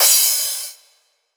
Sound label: Crash, Cymbals